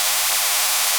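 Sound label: laser; KATANA; Daikatana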